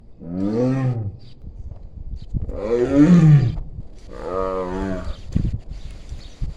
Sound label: tiger